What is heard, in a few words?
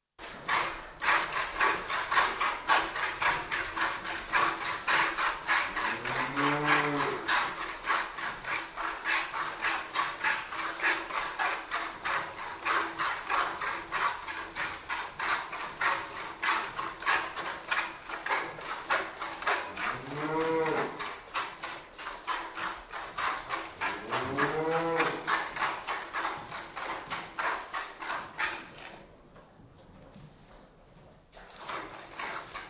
One Cow, interior, milked by hand, with some loud moos, bucket down on floor at end; Cow Sound Effects; BBC